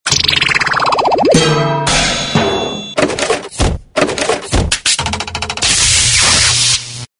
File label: comedy zany cartoon accent